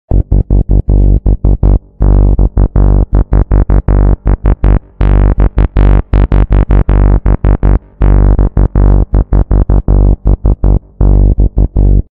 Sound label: Melting Bass